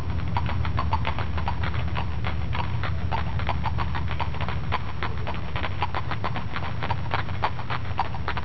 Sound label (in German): Pferde